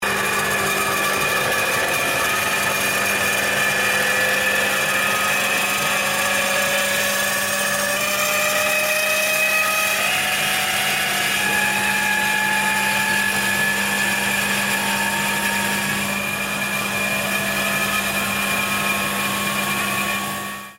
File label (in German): Sound Trommel Hochfahren